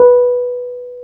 PNO, Wurly

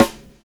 ATMOSPHER; Univers Sons

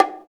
HI CONGA; East West - Phat & Phunky CD2; 90's Sample CDs

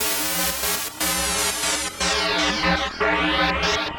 Kaossilator processed (120BPM); musicradar

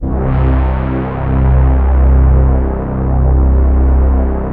DARK TIMES C2, STRING; Q Up Arts; Dream Experience

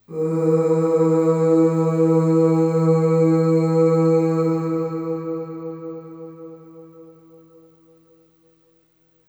Ooh Long E2 Long Reverb; Harmonic Building Blocks

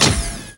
SINGLE SLA, HYDRAULICS; Northstar; The Wizard VOL-1

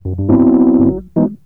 Rhodes, Keyboards; Total Drum&Bass

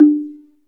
SLIT DR D 4, Heart of Africa; SLIT DRM1

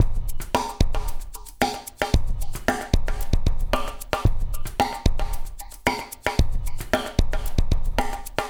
LQT AMB, Liquid Grooves; Spectrasonics; 113 LIQUITEK

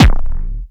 dry kicks; Quasimidi Rave-o-lution 309

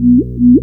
FILTER BAS, Electric Basses; PlatinumPhattCD2; E-MU Producer Series Vol. 8 – Platinum Phatt

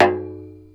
Percussive Hit, contact mic; musicradar; contact mic madness

